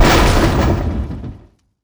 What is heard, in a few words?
Touch In, Damage; DCS